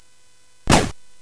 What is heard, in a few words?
Dark Castle, CSG8580R5